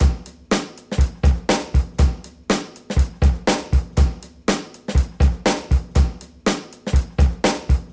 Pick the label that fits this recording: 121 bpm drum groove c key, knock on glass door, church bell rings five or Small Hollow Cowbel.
121 bpm drum groove c key